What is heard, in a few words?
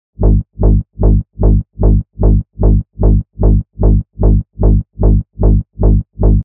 Reverse Bass Loop G# (150 BPM)